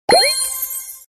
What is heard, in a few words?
pick boom; daily prize